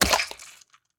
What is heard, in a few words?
Squished Impact; Boomerang Fu